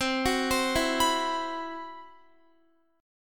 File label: C Major 7th Flat 5th C, Guitar; CM7b5 arpeggiated